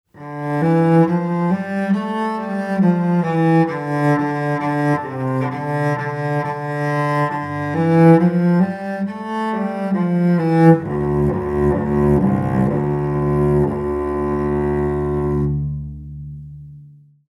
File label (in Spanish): violonchelo